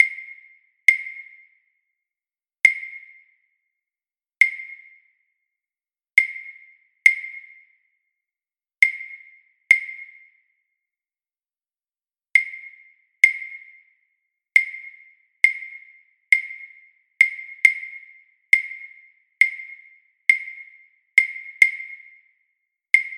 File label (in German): Rhythmus Grundlagen langsam 68 Bpm